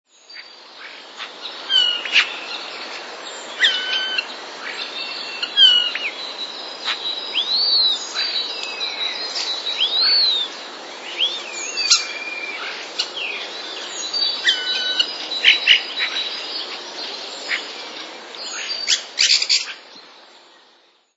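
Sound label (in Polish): szpaki, inne nagranie niezwykłych możliwości szpaków. Na nagraniu można wyróżnić głos podobny do okrzyków dzięcioła czarnego; wroblowe; zapozyczenia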